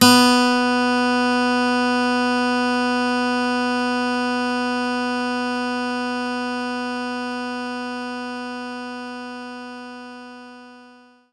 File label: Steel Guitar B4